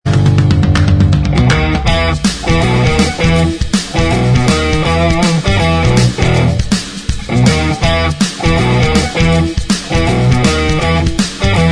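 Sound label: instrumental, backing tracks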